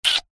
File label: human kiss single; zapsplat